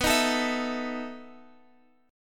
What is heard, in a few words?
B 11th B Guitar Half Step Down, chord